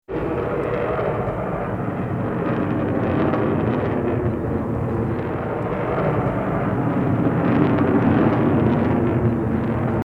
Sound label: Bullet Time; FXHome; Bullet and Gun